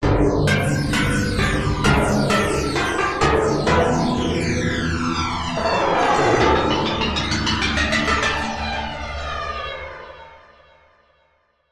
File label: FLANG